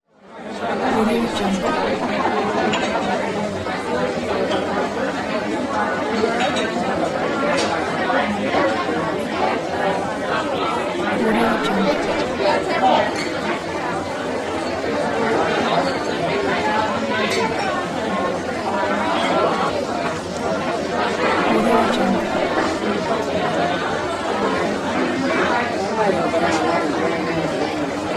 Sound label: bar crowd